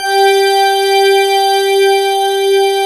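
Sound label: pop pipe G3, organ; EGLISEORG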